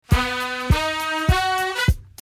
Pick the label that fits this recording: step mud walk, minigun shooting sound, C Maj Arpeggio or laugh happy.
C Maj Arpeggio